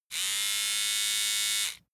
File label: servo steady